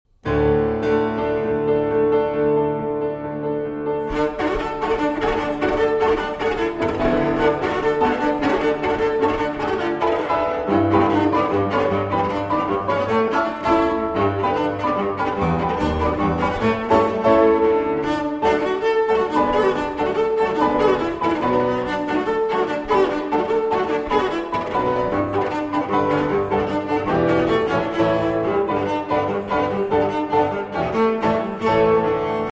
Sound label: Romanian Folk Dances, Romanian Polka; Six Romanian Folk Dances, BB 68, Sz. 56; Arr. Székely Transcr. Maisky